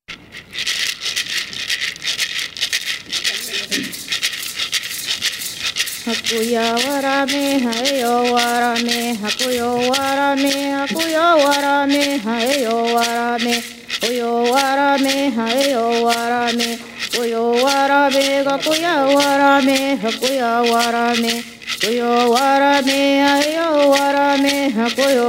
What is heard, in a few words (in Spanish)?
cochimi sin nombre, musica y danza tradicional indigena; 50 encuentros de musica y danza tradicional indigena